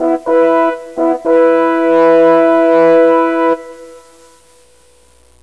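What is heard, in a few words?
HUNT HRN, Instrument; MUSIC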